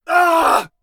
man scream ahh; human

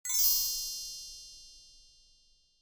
magic chime

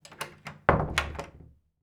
door close

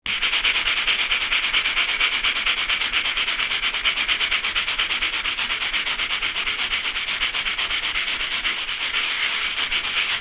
brushing; cleaning

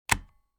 tape recorder close

